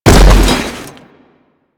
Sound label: Bodyfall; Foley; Warframe; Tenno